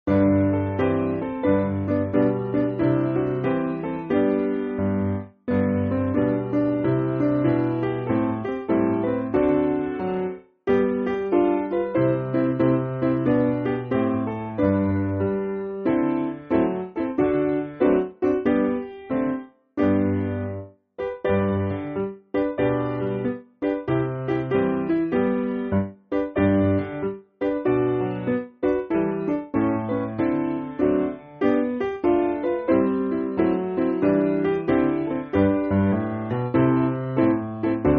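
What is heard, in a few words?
Piano, Music Backing Files for Churches; Come And See; Marching Through Georgia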